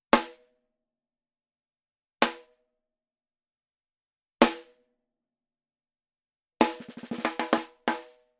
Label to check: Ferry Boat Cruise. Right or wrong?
Wrong